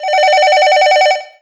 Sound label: Alesis Fusion Telephone Ring